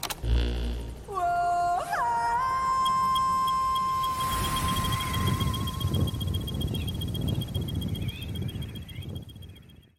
woah ho

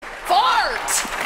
farts

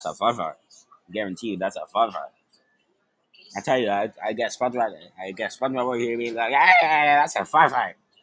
fart fart; das a fart

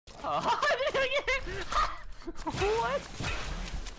laugh

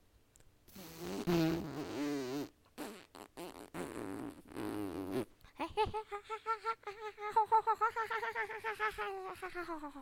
farting monkey

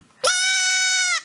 baby goat scream

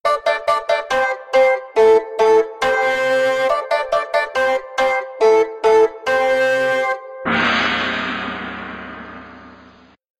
oriental riff

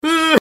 uuuuuh

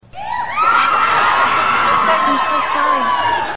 wooooo; saved by the bell

